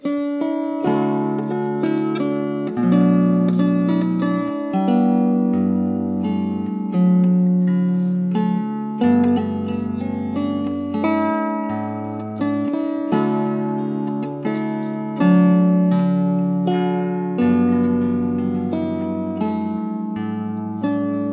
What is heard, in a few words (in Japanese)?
小型アルト Kleine Altleier